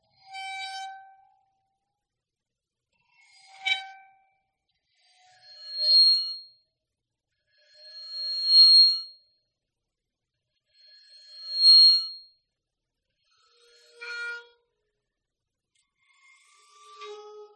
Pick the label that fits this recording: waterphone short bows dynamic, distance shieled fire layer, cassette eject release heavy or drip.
waterphone short bows dynamic